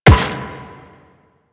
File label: Run Metal, footsteps